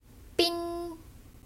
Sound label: Pin